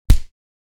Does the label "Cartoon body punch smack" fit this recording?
Yes